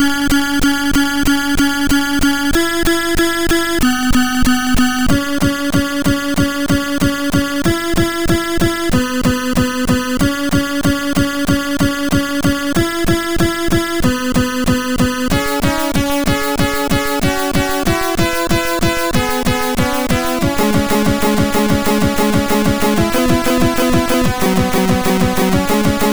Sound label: Disco, MOS6581R4; Sux